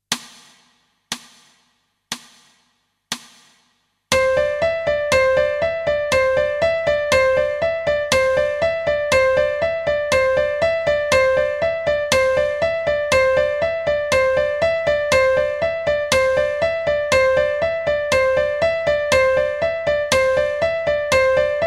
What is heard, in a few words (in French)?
doubles-croches; rythmes faciles